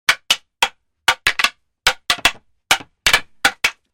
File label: Staff Fighting Routine, Martial Arts and Human Impacts; Hollywood Edge Martial Arts and Human Impacts